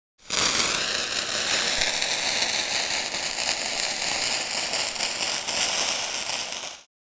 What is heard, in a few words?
Fireworks show light the fireworks